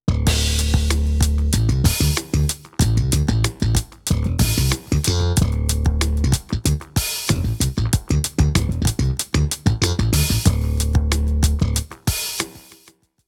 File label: son ebass slap; korg